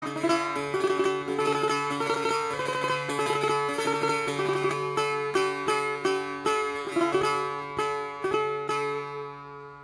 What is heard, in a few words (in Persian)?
نمونه مضراب شلال